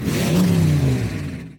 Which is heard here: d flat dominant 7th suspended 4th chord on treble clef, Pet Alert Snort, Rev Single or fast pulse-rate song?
Rev Single